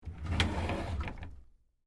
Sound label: Drawer Open Hotel, Foley, Drawer, Open, Hotel, Dresser; Household; Drawers